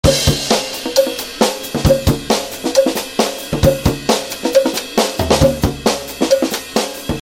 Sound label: rare groove loop